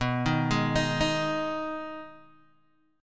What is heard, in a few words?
B 6th B down Guitar Open G